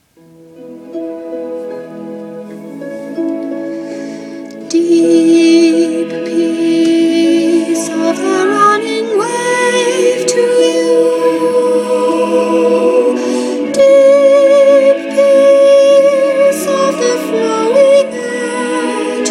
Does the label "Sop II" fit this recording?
Yes